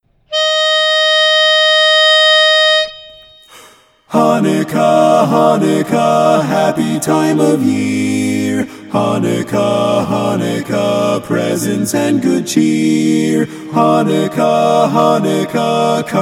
4 part; Christmas

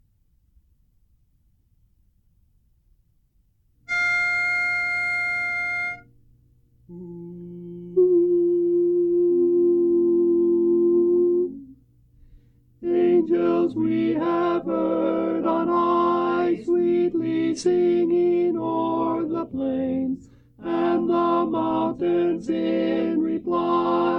Angels We Have Heard On High Tenor; Christmas

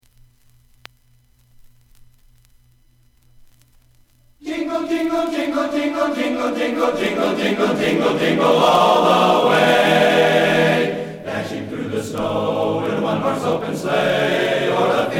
Jingle Bells; Christmas

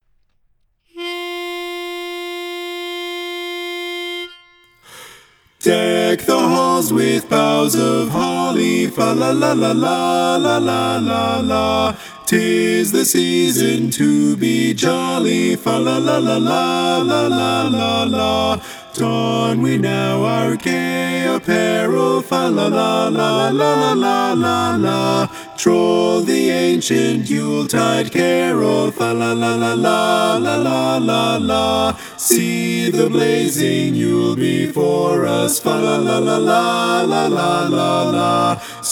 Deck the Halls, lead missing; Christmas

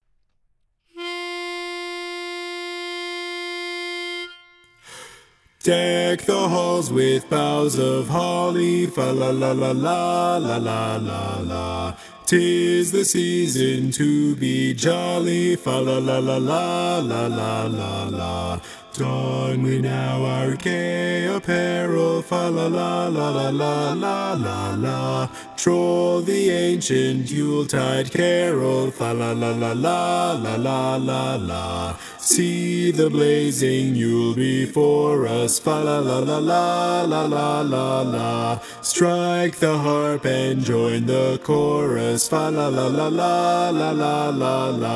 Deck the Halls, bass; mono; Christmas

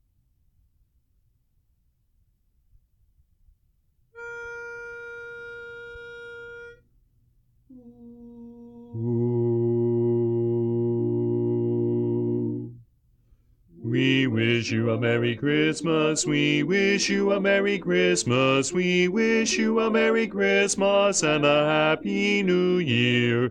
We Wish You A Merry Christmas Bass

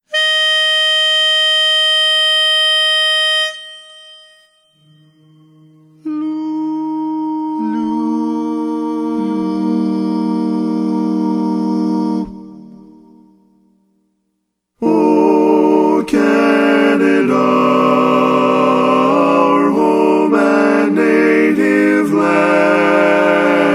O Canada, Bass missing

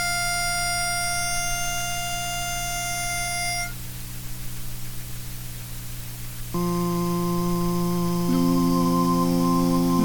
tenor missing

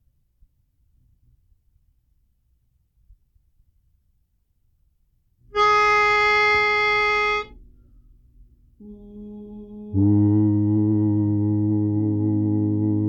O Come All Ye Faithful - Bass; Christmas

